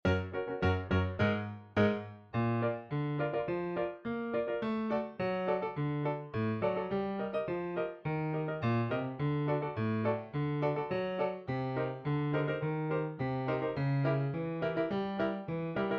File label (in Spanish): tono 4 piano solo; convocatoria a audiciones el mago de oz